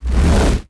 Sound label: flaming Axe swing; weapons